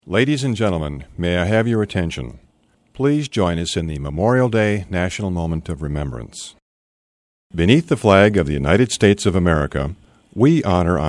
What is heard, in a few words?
taps with